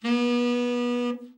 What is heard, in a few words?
TEN SFT, TENOR SOFT, Sax; Giga Samples Collection